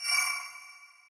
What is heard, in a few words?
Main Menu Mouse Over; bejeweled 2 deluxe